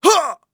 warrior attack swing

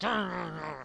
Laugh

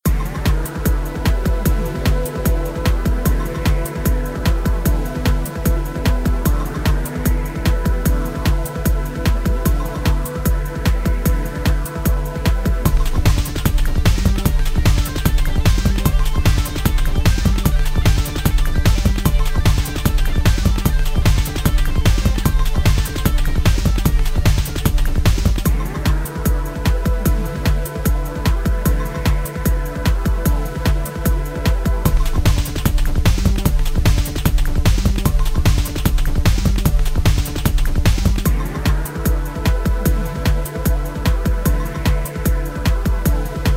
Bossfight; the next penelope

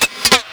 machine

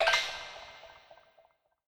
rating up

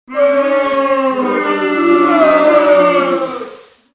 Crowd Boo; Dance Dance Revolution Extreme; PS2

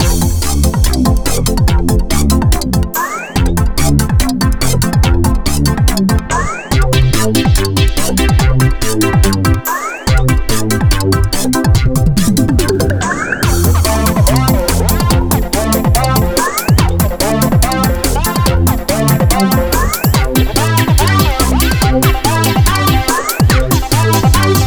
Mus Throw; EyeToy Play Sports; PlayStation 2

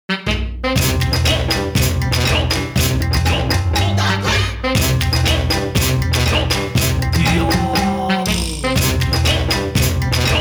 Jingle Entry; Taiko no Tatsujin Atsumete Tomodachi Daisakusen; Wii U

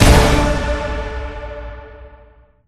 Fuse Levelup; Spider-Man Unlimited; Android

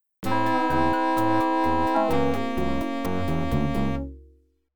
Gameover

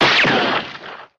cowboy shot

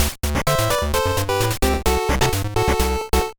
Match Results Chiptune Version; one piece going baseball kaizoku yakyuu

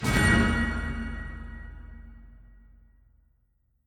Mus Multi Jingle Pickup flag; Chronicles of Riddick Assault on Dark Athena

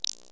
Creatuer

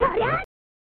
Yelling; Sailormars

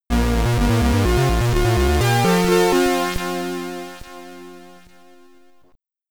Victory, SID Stereo; Blue Angel 69; Commodore 64

